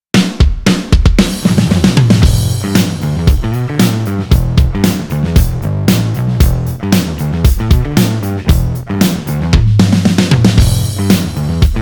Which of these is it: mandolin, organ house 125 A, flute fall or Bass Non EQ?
Bass Non EQ